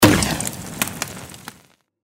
torch Light; tiki-beats; eyecon

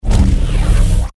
anticipation start; Crystal Furnace

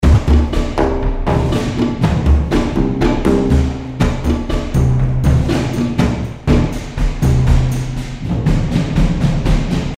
music hammer Throw Bonus; Highland Reels

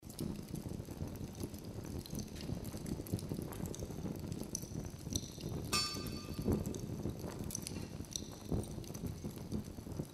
base game ambience; Crystal Furnace

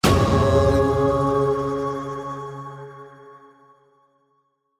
special Symbol land; Shamans Dream 2

